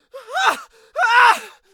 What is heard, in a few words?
man scream; DeadFright